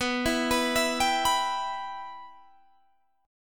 B Suspended 4th Sharp 5th B Guitar Standard; Bsus4#5 arpeggiated; JGuitar

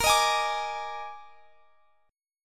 D sharp Major 7th Suspended 4th, A sharp

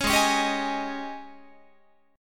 G sharp Major 7th Suspended 4th, Guitar

